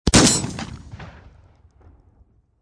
Shotgun 12 Gauge Spas-12 Semi Automatic Single Shot Close Perspective